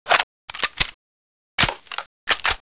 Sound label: akimbo gun l reload; weapons; ayakashi